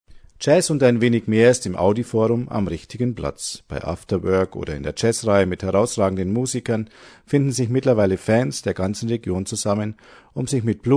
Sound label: bar jazz